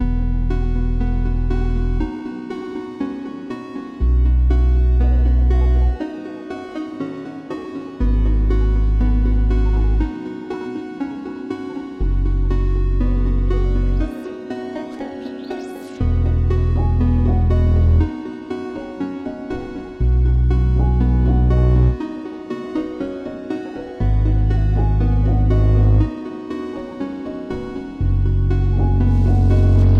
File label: 120bpm B maj